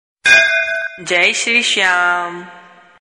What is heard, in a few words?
Sms Tone Ringtone; Jai Shree Shyam